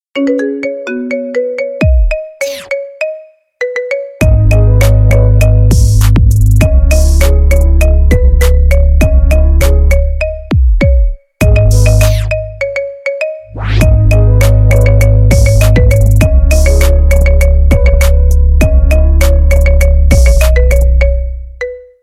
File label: marimba remix